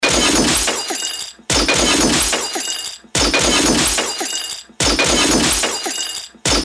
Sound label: gun loop